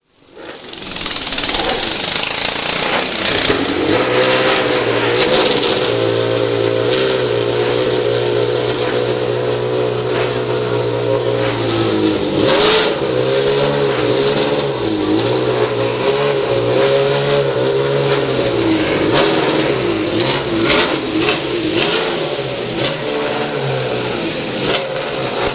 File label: warm-up, ducati